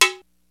DPM3 kit, PEAVEY DPM; Rubber Chicken Software Sample Bank

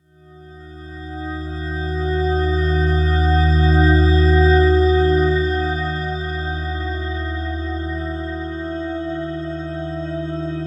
SLOW MELODIC BELL WAVE; SLOW SYNTHESIZER WAVES

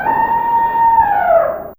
Elephant; Fairlight CMI IIe

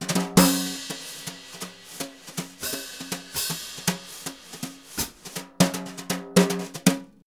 BRU, BRUSH; Univers Sons; Jazzistic

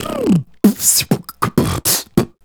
Beat Box, Hip-Hop and RnB Vocals

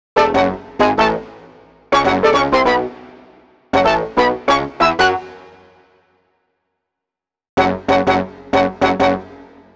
Sinthetik Horns; Cinematic Funk; Sonic Foundry (Sony Creative Software); Cinematic Funk Urban Tone Poems

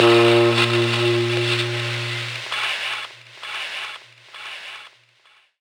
SAXOF; Rawcutz - Nu Forms

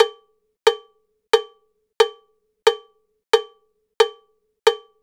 Cow Bell, Latin Percussion; Sonic Foundry (Sony Creative Software); Joe Vitale Latin Percussion

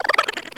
Laughers; SNES; Spawn

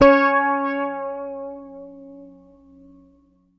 Bass; Sampleheads; Will Lee Bass Library

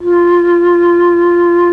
FLUT1; ILIO; Propeller Island Legendary M400

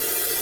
CYM LOOP; Ensoniq ZR-76

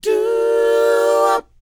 DOWOP C, Vocal R&B DOO WOP; ILIO; Vocal Planet

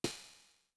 Cym; Sonic-Potions LXR

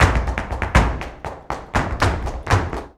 BULERIAS TAP, Flamenco

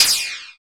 PER PROCU, Procussion

